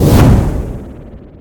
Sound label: beam attack; osu